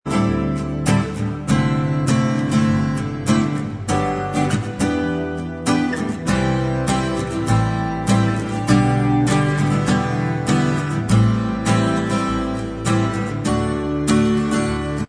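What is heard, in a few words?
acoustic version instrumental track, backing tracks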